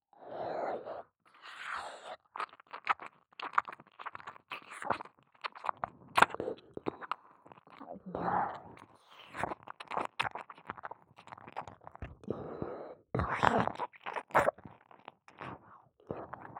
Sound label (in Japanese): 耳舐め（左後方）; ネモナ